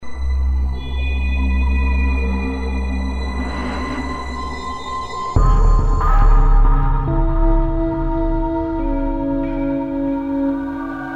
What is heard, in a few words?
evil and horror, halloween